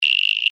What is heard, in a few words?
Insect Voice Chirp, Vocalization, Bug; Animal